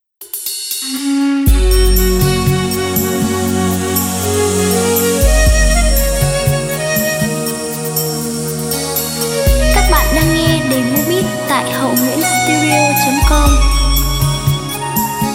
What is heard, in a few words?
beat, playback